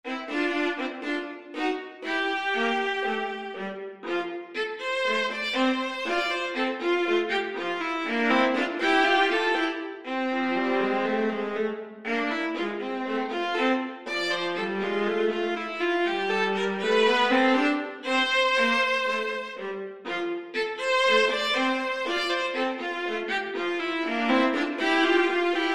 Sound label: Ragtime